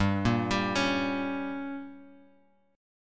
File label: G Diminished, Guitar, Open G